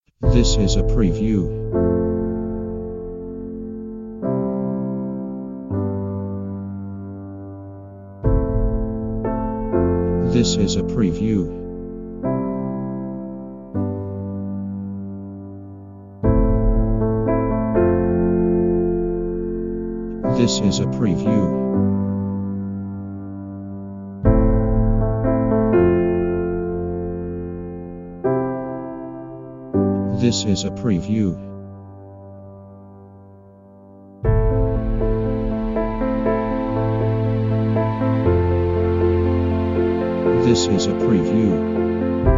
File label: Orchestral Motions